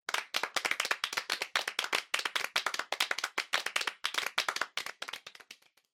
Applause Mid

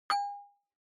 Onoma-Ding (Low-Short)

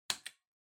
Light Switch Wide Panel Dry Mid

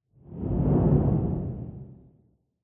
Camera Motion Low Reverb; シーン・カメラモーション

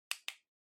Portable CD Button

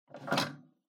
Public Phone Receiver Pick Up Gentle

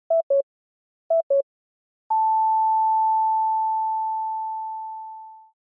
Time Signal Beep Mid

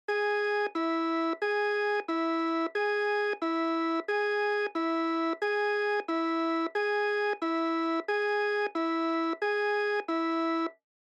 Ambulance Siren Fake Low

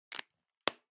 Felt Tip Pen Close

